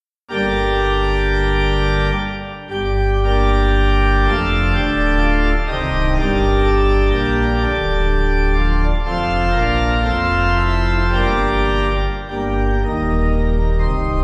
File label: Pipe